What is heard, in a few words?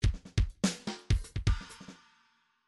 bdf midi file grooves funk misc funk misc